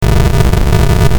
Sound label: retro; maoudamashii